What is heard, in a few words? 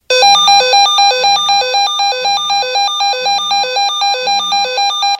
Pager